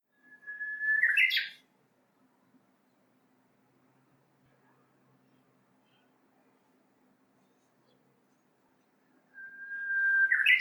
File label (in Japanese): Uguisu